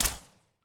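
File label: attack arrow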